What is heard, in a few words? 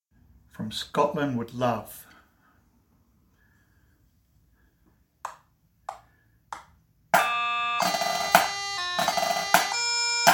drum, pipe band